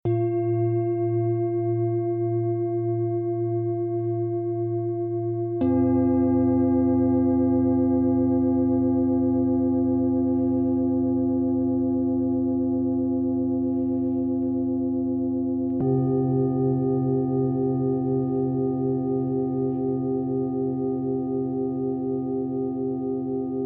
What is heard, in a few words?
Tibetan Singing Bowls; AskTHEO Meditations